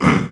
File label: grunt; tenpin